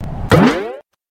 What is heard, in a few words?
bouncy